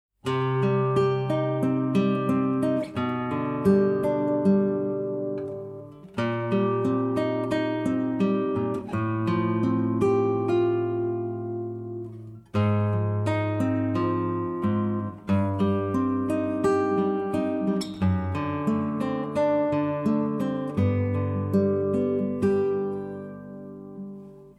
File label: Melancholia For Guitar